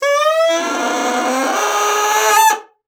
BASSOON; Xsample VOL-6

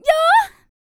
Vocal, R&B & Dance, FEM. HUMAN

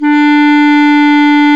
Clarinets

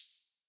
HH, Dance Drums; Best Service; Dance Mega Drums; HH HOU

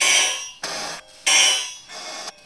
shake loop; Rarefaction - Poke in The Ear With A Sharp Stick

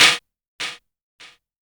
hi hat open, Korg Electribe ER-1

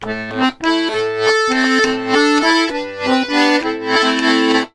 Complete Accordions; USB Soundscan vol.40; AKAI

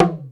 Bnt Bongo, Alesis D4 drum machine

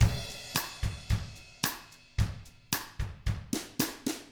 COUNTRY, Drums; ILIO; Double Platinum Drums 1

